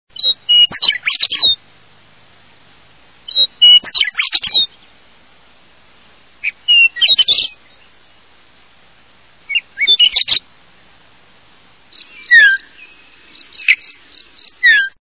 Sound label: Western Meadowlark, songs